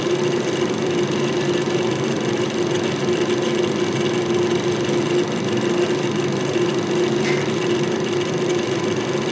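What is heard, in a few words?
ambient fan loop, electric; Half Life Model Pack; Half Life Alyx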